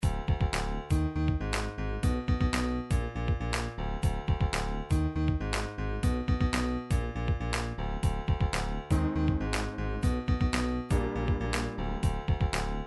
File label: hardcore, midi